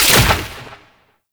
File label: Orb Destroy; Warframe; Duviri; Conservation